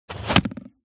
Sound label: weapons bow arrow arrow hit